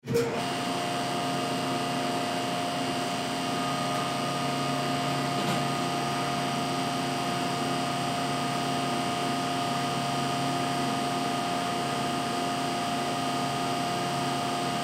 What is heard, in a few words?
Hospital Mri Machine On Run Off; Emergency